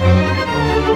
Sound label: Strings; Sonic Foundry (Sony Creative Software) - Orchestral VOL-2